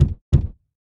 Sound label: Window Knock